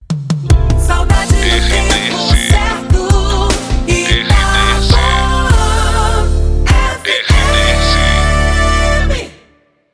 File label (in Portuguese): PASSAGEM SAUDADE; Itapoan FM 2020